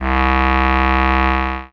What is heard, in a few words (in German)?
c2, Bass Klarinette, MIRAGE; Retro Sampler